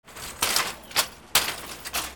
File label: BANGS